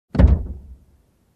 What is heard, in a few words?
Car Hit from inside